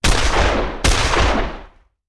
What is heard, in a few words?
Shotgun; Sound Ideas; Guns